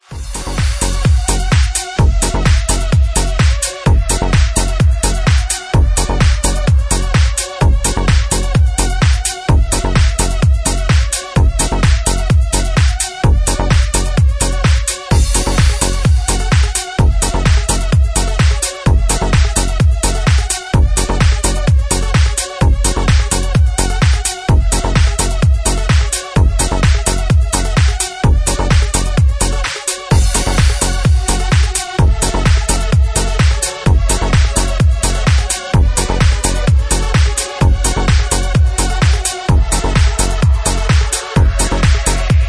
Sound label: Electro Mix